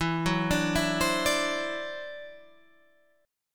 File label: E 7th Suspended 2nd Sharp 5th, E, down